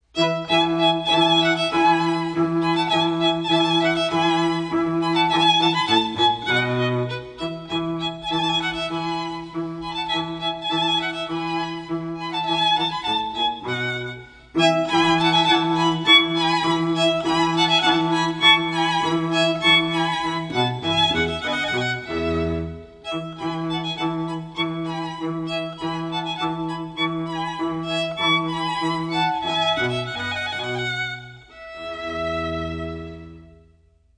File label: String Trio; Vivaldi Spring Four Seasons